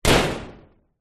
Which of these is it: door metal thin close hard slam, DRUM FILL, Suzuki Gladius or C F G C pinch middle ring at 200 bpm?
door metal thin close hard slam